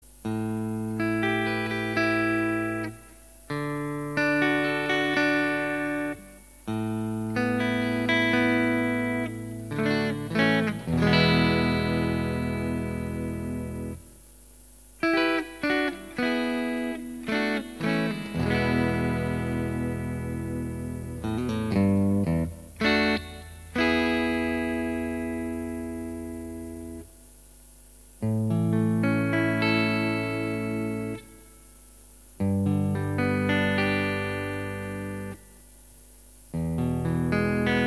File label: Vampyra alnico 5 humbucker, bright cutting tone; Bridge Tapped; The Vampyra offers bright cutting tone with extra highs for maximum clarity.; Magnet Alnico 5